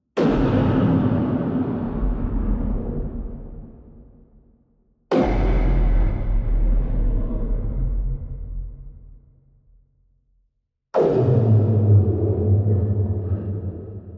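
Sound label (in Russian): дикий бафф темной силы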